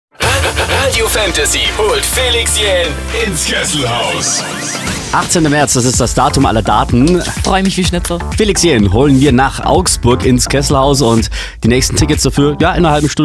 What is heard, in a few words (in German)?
Glocken Spiel